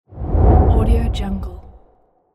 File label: dark air whoosh